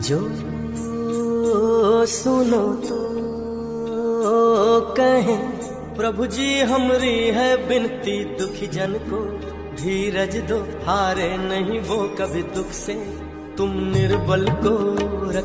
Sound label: Bhajan Ringtone; Krishna Bhajan Status